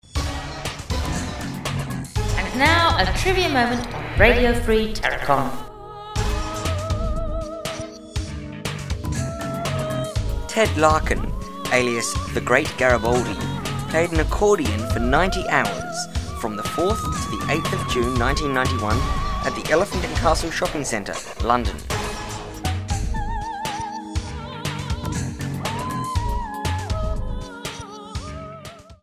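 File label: Accordion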